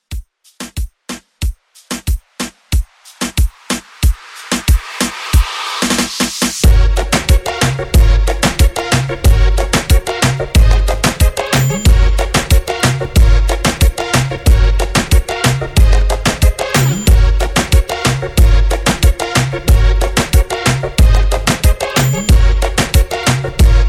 Reggaeton Re-Drum 92

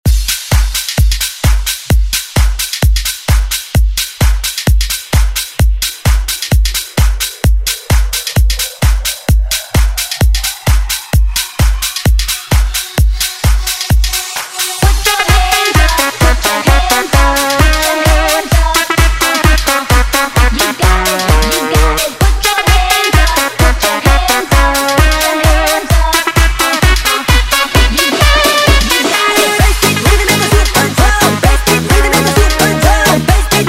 Super Drum Turbotronic Dance Hype Intro

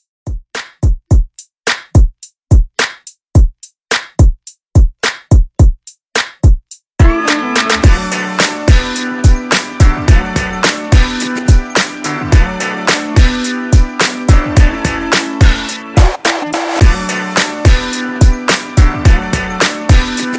Re Drum 90s

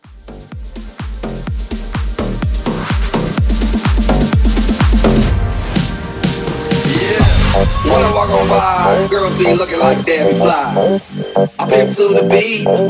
Hybrid Horn, 126

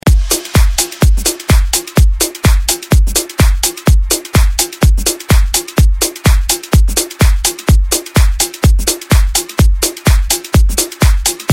House Edit Club Mix; Mainstream VIP; Vegas Edit

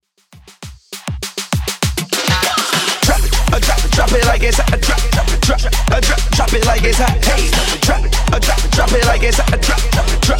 Extend Hype In 100bpm DIRTY

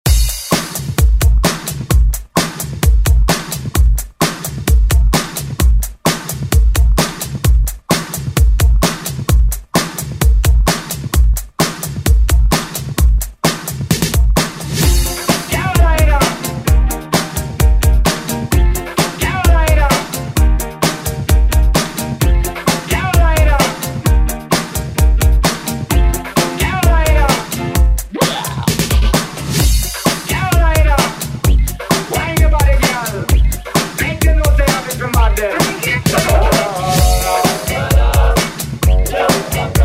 Oldschool Redrum; Deejay Hit

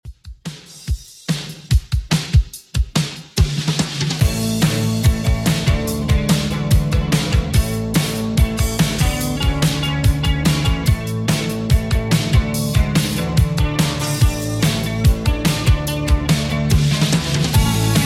80s 144 bpm; Redrum

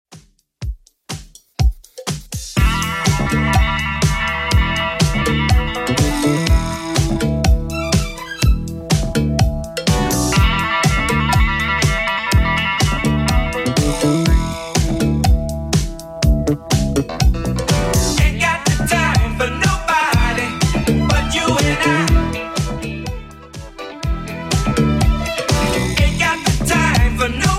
70s Redrum 123bpm

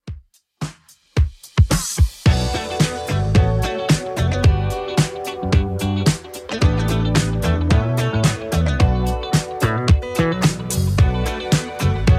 70s 110bpm; Redrum

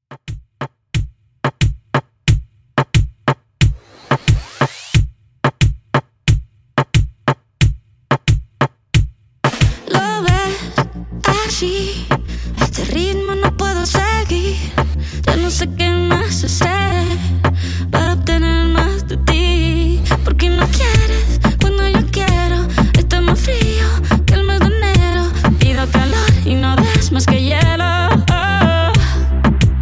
In Outro Reton 90Bpm

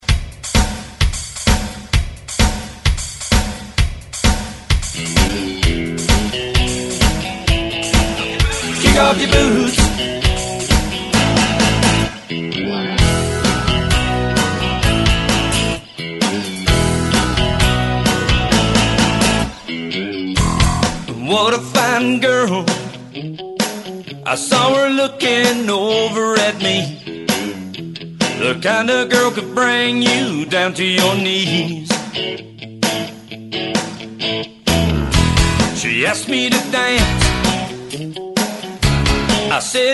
90s Rock Re Drum 130 Bpm; DJDOLLS

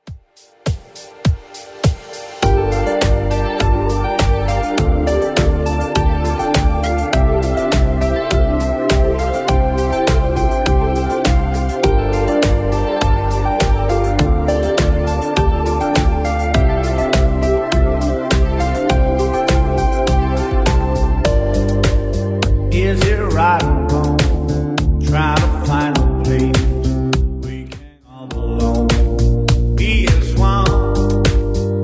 80s Redrum 102bpm

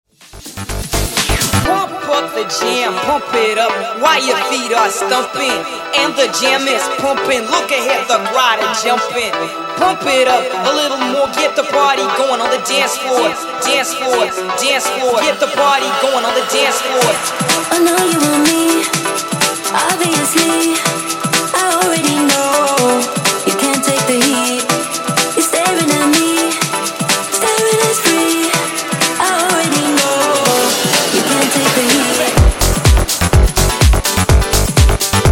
Funk Remix 125Bpm

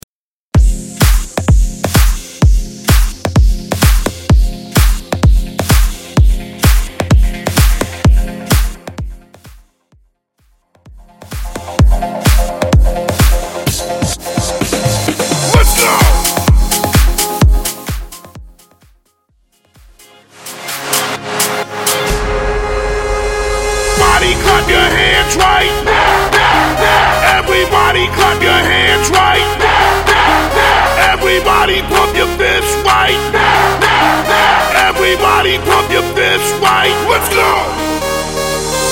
90s Rock EDM; Mainstream VIP

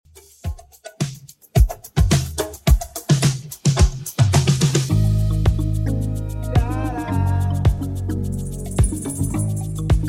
80s Redrum 109bpm

